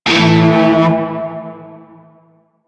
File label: Shawzin Chromatic Chord; Warframe; Narmer Shawzin